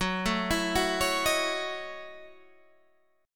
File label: F sharp Minor 13th, Guitar, Standard; arpeggiated; JGuitar